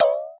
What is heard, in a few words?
multi ball; Ultranium 3D